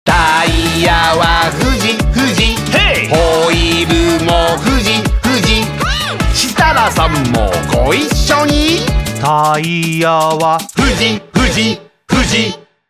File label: vocal; tiktok